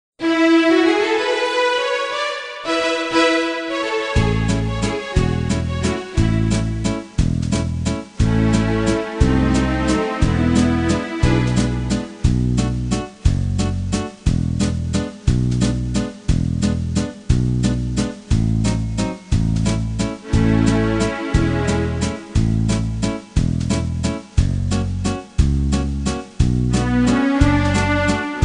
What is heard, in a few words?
Musette, accordeons